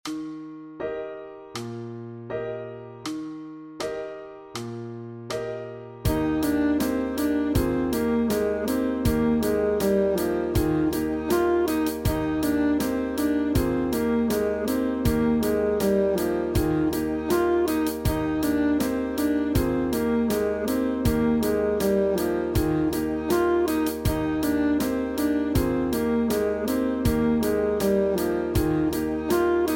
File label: Sax Study